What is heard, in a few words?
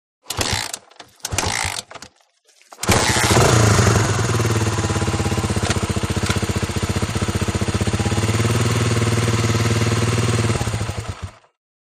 Start Idle Off Honda Trials, trials bike